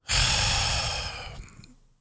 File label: S I G H